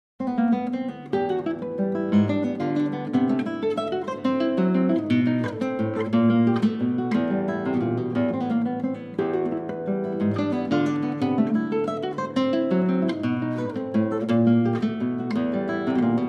Venezuelan Waltz Solo Guitar

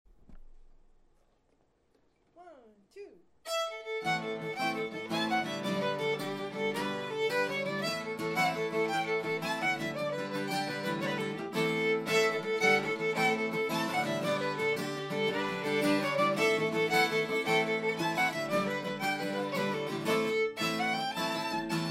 celtic band

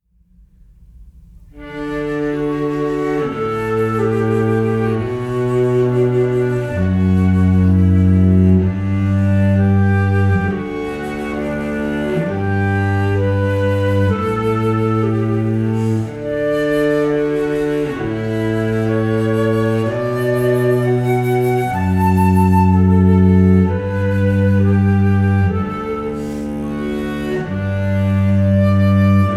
Flute Duo; Pachelbel Canon; Cello

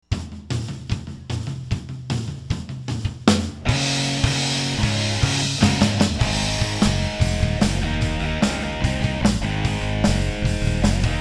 cover band

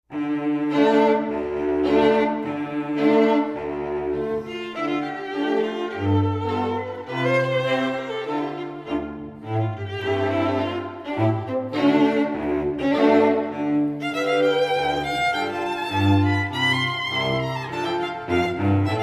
string quartet

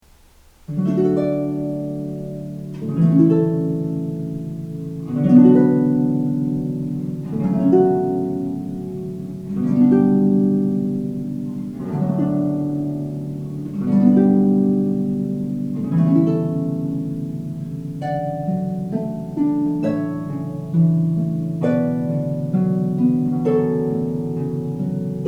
Canon in D. Pachelbel, harp

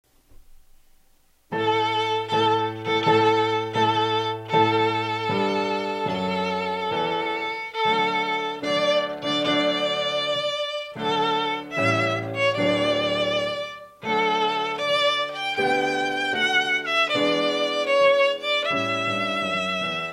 violin

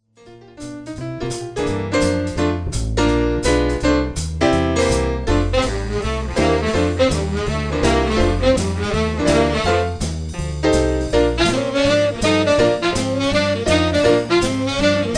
Big Band

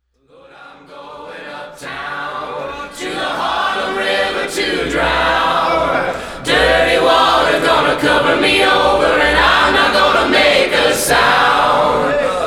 Boom!, percussion

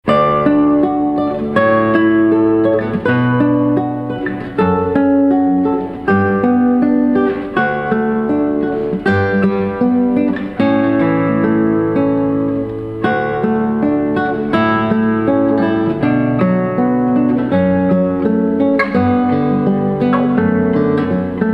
Canon in D, Classical Guitar; Pachelbels Canon

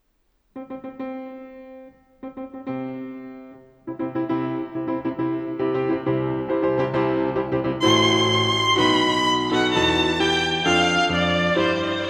Wedding March, Violin; by Mendelssohn; Eclectic Violin Music

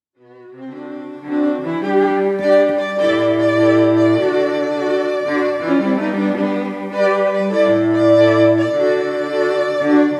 String Trio performing

